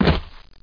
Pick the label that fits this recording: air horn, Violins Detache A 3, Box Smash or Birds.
Box Smash